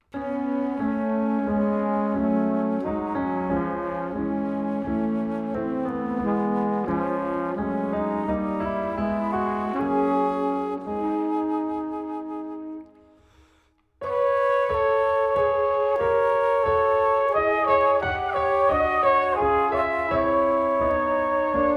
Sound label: Interlude In C Sharp Minor